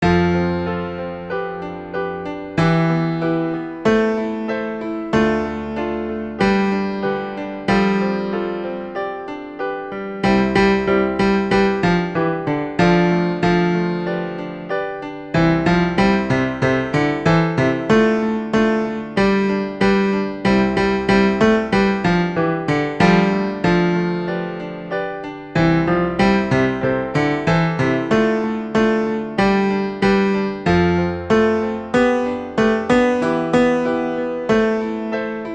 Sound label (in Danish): tenor; Anemonesangen